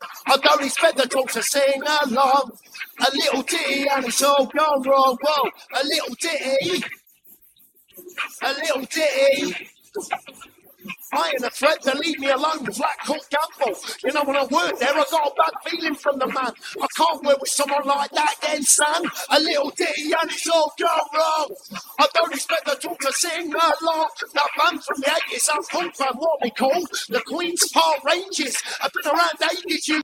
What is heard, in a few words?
vocal